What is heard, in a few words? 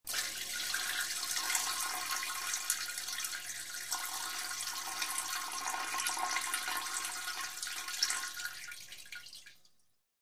Man urinates into the toilet